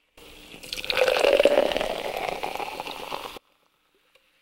beer pour

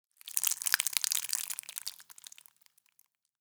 meat drip

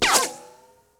gun ricochet

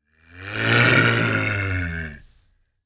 leopard snarl

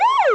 ambulance siren

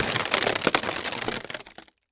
wood cracking

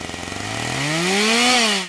Chainsaw cut

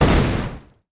Grenade Expl

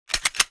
handgun reload

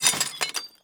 dish break

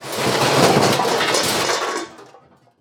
trash can hit

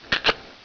soda can open

